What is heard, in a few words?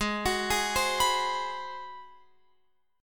Ab 7th Sharp 9th, Guitar, Open F; arpeggiated